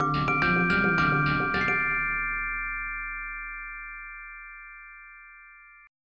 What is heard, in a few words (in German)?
donner; Flashback Demo